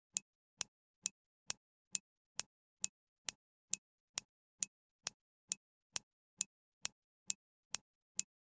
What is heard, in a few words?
Time passing fast clock tick tock